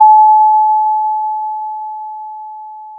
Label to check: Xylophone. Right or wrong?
Wrong